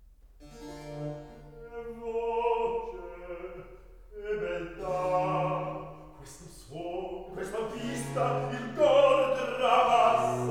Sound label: Recitative; Apollo e Dafne, HWV 122; Che voce! Che beltà!; Handel